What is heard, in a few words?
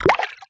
Coin waterdrop; We Are The Dwarves